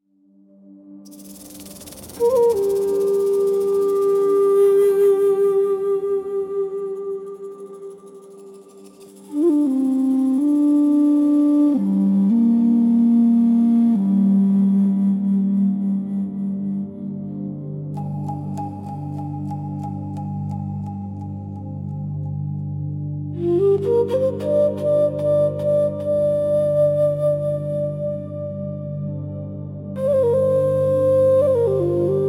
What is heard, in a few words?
echoes of the amazon a cinematic jungle ambience soundtrack